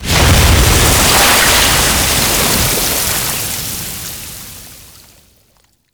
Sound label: Sealab Wave Crash Large; Warframe; The New War